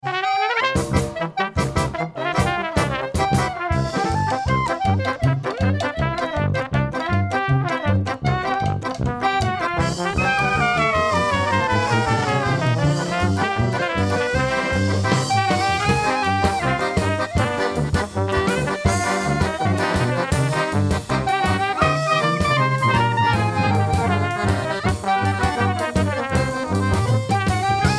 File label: C Minor Bulgar